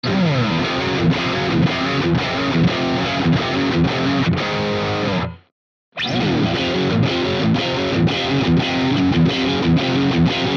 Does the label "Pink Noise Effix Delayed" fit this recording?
No